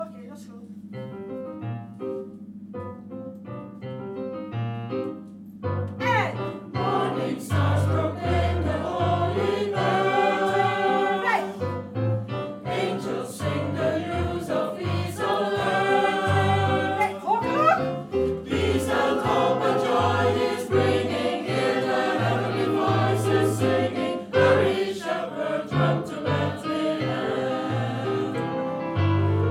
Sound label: SATB